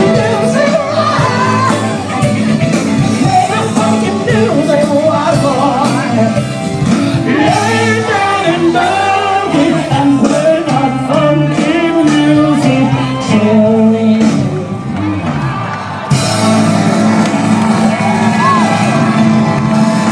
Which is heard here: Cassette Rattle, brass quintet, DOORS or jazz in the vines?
jazz in the vines